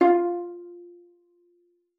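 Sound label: violin pizzicato F4; Xsample VOL-5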